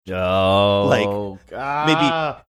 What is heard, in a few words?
drawn out ohhh aahhh